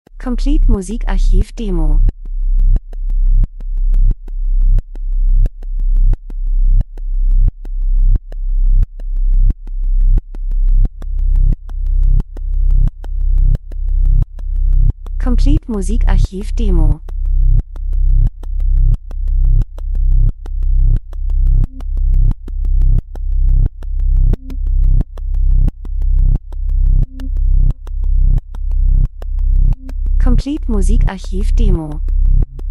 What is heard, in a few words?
drums; Elektro Rhythmus Herzschlag Arzt Krankenhaus Diagramm Ausschlag Gleichförmig Tiefe Kälte Maschine Industrie